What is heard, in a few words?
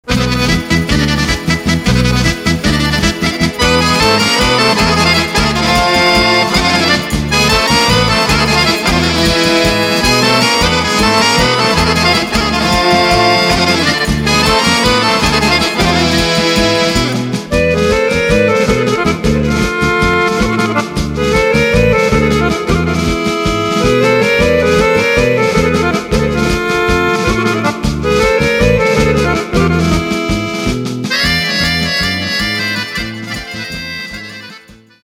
Cocek